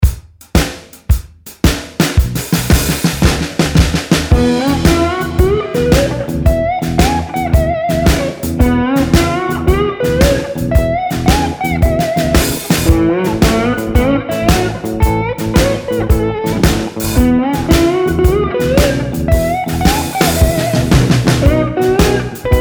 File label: slide